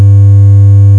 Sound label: 100Hz Sin; AcouSynthesis